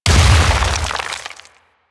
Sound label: Stone Death; Warframe; Brawler